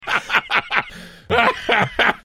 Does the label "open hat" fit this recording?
No